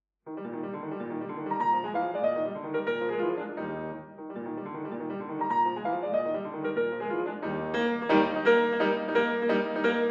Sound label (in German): Sonate